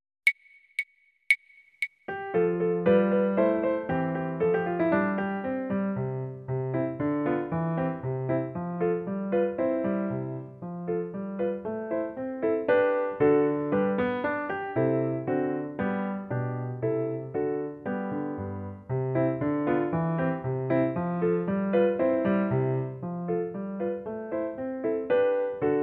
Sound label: Allegro accomp; Grade 10 Class Exam Solos; Xtras Play Mozart